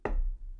thud

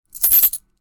coin; Myner Troubel

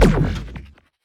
Launcher Release; FPS; Weapons; FirstMicroGame

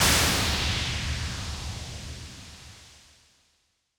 Encounter, Battle SFX; Leohpaz; RPG Essentials Free

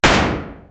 gunshot

